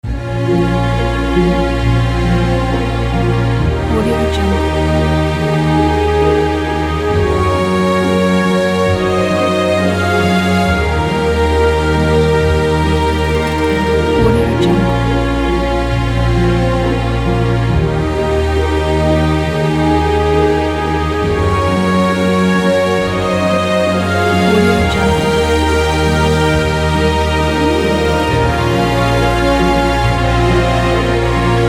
orchestral theme; romosel

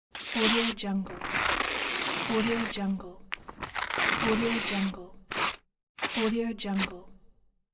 paper tearing

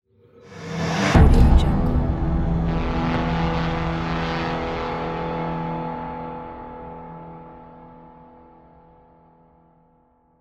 futuristic synth stinger

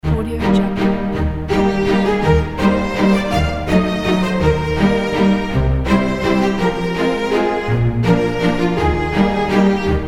waltz string classic music; tchaikovsky

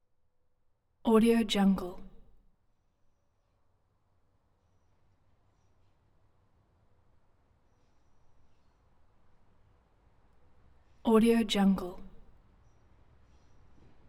train slow pull in

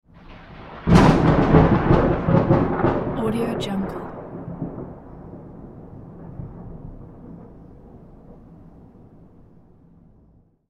loud lightning

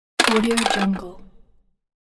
coin inserted

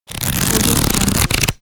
thumbing through book pages

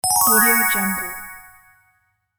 magic fairy spell

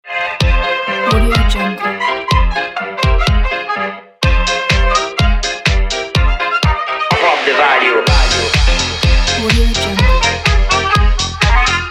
electronic swing pack

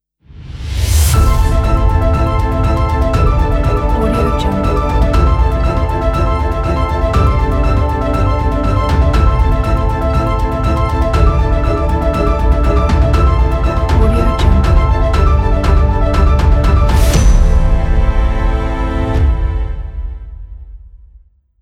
cinematic orchestral ident

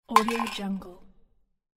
metal can dropped on asphalt; vintervarg

